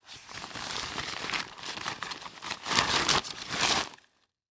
Sports Stake Metal Stake Sliding Out Of Paper Bag

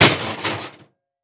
Impact Metal Hit Cement

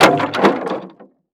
Crash Wood Board Dropping On Wood Debris; crashes; AdobeCC

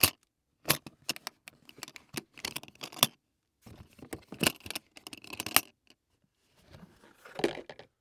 Industry Tool Tackle Box Unlock And Open

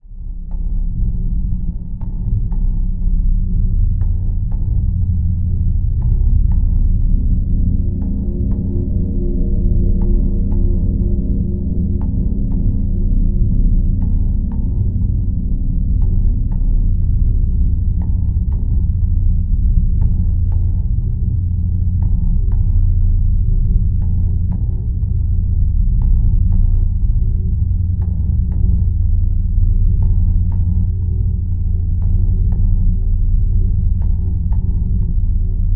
Drone Bed Pulsing; Production Element Imaging; AdobeCC

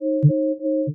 Sci-Fi Electronic Computer Processing; Science Fiction

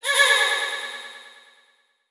Title Transition Delay Short; Production Element Title Transition

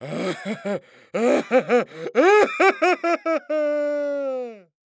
Cartoon Male Dopey Laugh; AdobeCC

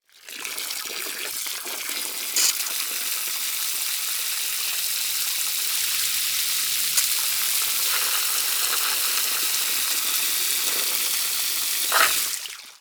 Liquid Water Water Hose Sounds

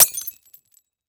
Crash Glass Bottle Break On Cement; crashes; AdobeCC

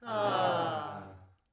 Human Crowd Ahh Soft

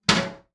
Household Toilet Drop Seat

